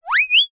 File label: cartoon slip trip up